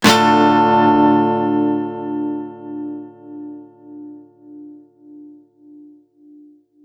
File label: Guitar 128 BPM Dmin, One-Shots; Ghosthack Advent Calendar 2021 - Day 10; Guitar Loops and One-Shots